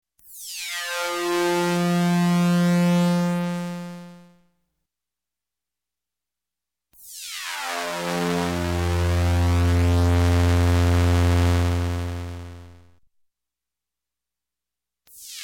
Space Horn, Electronic, Music; Sound Ideas 2000 Series

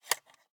Viewmaster, plastic, lever, release; Eiravaein Works; Vaeyan IV

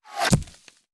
Bullet Impact; Sound Ideas; Guns

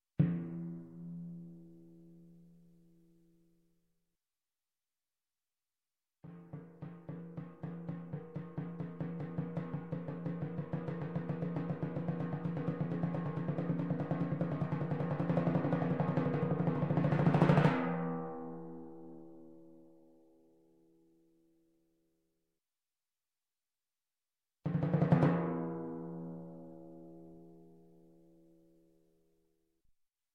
Timpani roll, high Hit, Music, Percussion, Drums; Sound Ideas; Series 2000

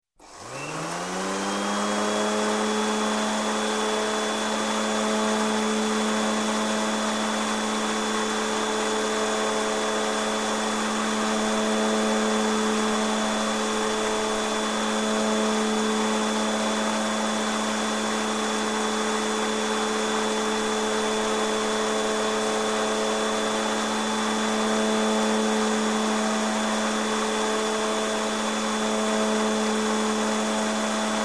Lawn Mower Electric Start, Run, Stop, Gardening; Sound Ideas; Series 2000

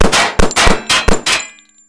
Gun, Hand, Two Fisted Firing Into Metal; gun and weapon sounds; captain audio; fully loaded

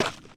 dirt; firefight

